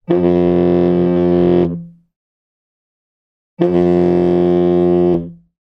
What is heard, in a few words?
technology cell phone vibrate on table; sound effects one